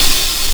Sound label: HH OPEN